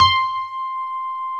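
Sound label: grand piano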